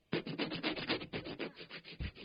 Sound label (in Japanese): 切る, 生活音; 生活音系効果音